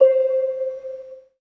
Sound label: BELL (C); One Shots; Afterlight; Cymatics; Mystery Pack Vol 4